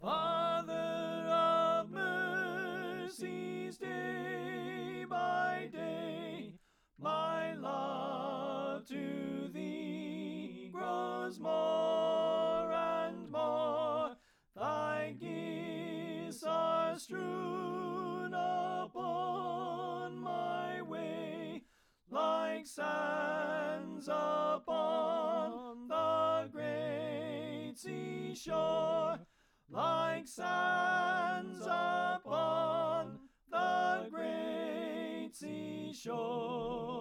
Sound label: Alto Left